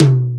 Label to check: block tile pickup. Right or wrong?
Wrong